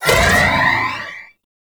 Pump Shotgun Alt Proj By; Weapons; Warframe; Zariman

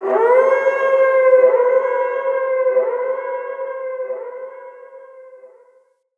Quest Wolf Cub Howl Stinger From Ship; Animals; Wolf Cubs; Howl Stingers; Orengal

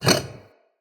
Vase Medium Slide; Vases

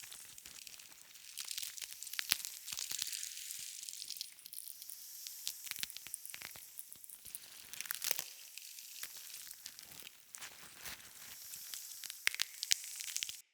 Blind Sand Target Loop; Powers; Fey; Saboteur

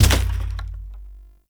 Stadium Drumkit Destroy; Warframe; Ambience; Nineteen Ninety Nine

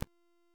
Cave Figurine Assembly; Warframe; Duviri; Teshin

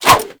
Sword And Whip Whoosh; Warframe; Weapons

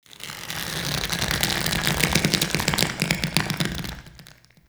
Infested Tendon Stretch; Warframe; Corpus Infested; Ambience

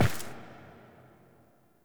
Foley Turn; Warframe; The War Within; Queens Lair; Cinematics

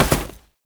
Step Snow, Foley; Warframe; Oraxia

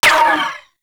Uzi Layer; Warframe; Tenno; Prime Tenno Uzi

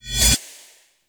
Choir Eyes End

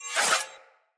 Sphere Sword Whoosh Accent; Weapons; Swords; Sphere Sword And Board

